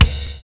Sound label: LIKE 808